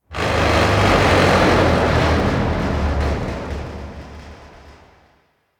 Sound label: Destruction Texture; Warframe; Corpus; Railjack